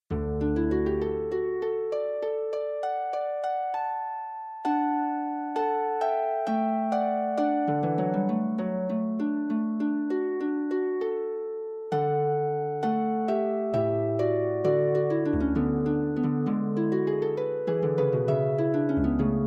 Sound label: Prelude, harp; Forty Eight Preludes and Fugues Book 2; prelude and fugue no 5 in d major book 2